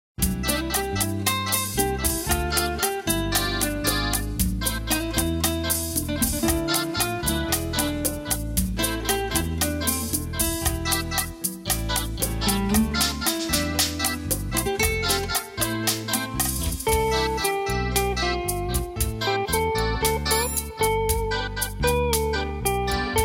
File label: instrumental